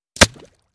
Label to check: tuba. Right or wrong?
Wrong